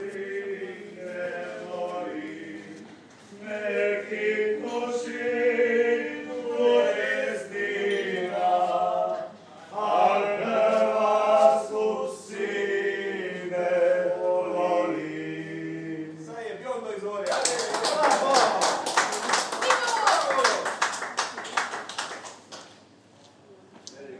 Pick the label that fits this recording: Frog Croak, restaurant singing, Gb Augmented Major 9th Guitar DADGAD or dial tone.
restaurant singing